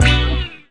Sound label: dubby guitar hit; Punches Hits Discoblasts